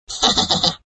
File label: Laugh; bobtherobber2